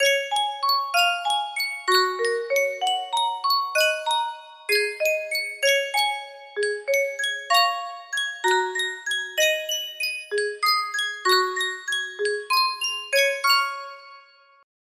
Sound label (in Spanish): sankyo caja de musica